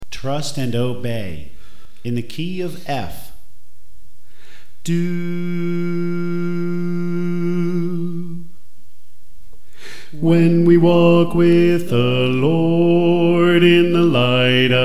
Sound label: Bass